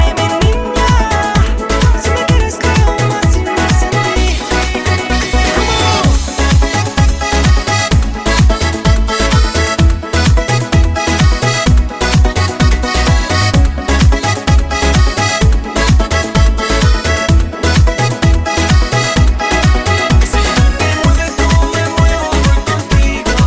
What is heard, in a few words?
latin beat rmx